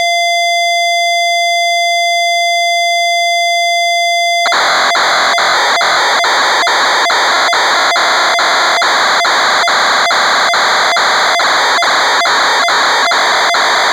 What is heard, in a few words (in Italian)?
CHITARRA